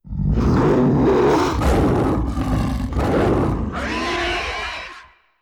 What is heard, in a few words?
Monster Growl Scream